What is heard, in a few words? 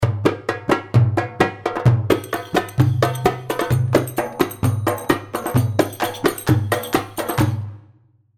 Maksum 130, darbuka